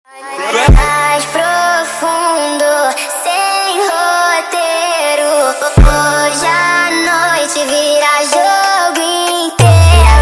sped Up

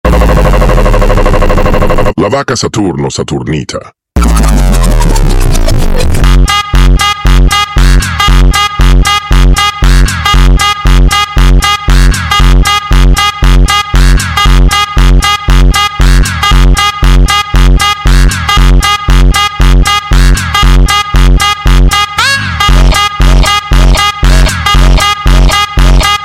Funk super Slowed

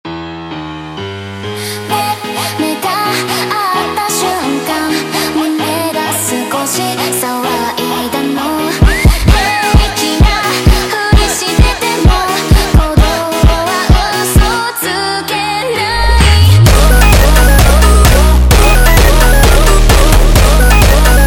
hardstyle Version

